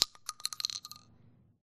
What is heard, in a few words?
12 gauge shotgun shell drop; Titanfall 2